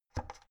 oven knob turn hard

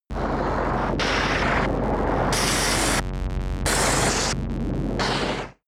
scatter vent buzz

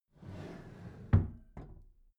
packed drawer smooth clatter close soft halfway

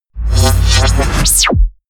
sweep

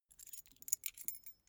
key ring small pick one

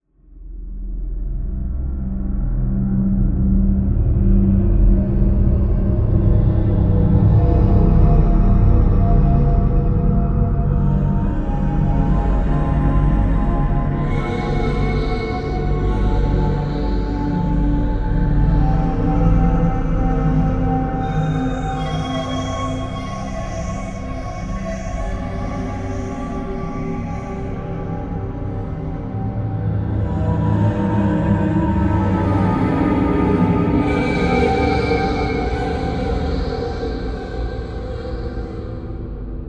strange drone

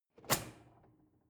drawer cutlery close draw short soft

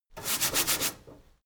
mechanic sink brush

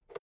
fader single slide click

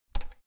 oven button press hard

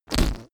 whoopee cushion fart

